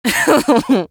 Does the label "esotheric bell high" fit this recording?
No